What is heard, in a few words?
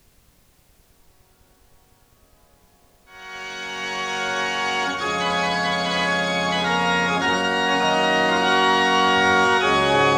Preludium in F; G Bohm